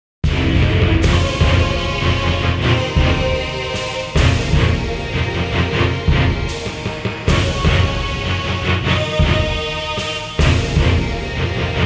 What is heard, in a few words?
Tension; Kokumeikan